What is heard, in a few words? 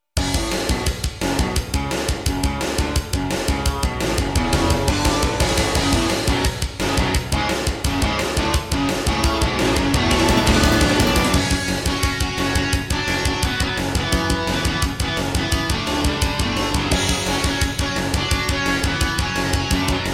Intro, Roland SD-90 GM2 MODE; Duke Nukem 3D